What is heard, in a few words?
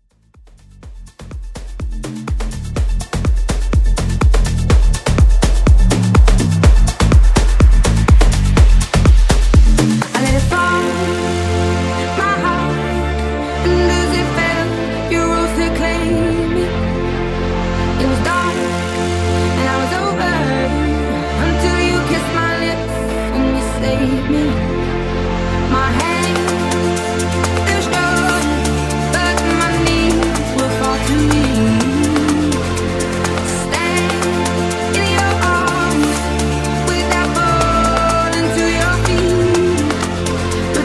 Afro House